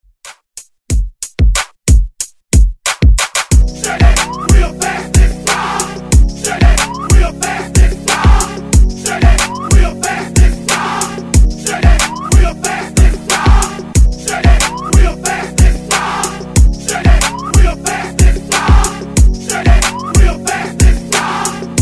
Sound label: Top40 Redrum